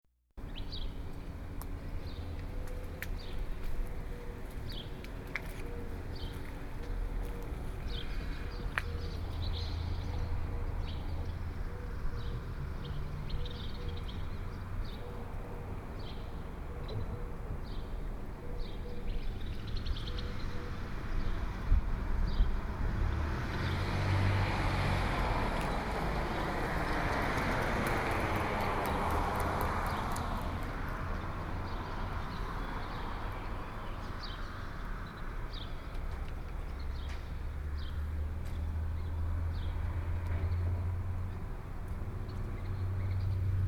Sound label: train yard tucumcari; fieldrecord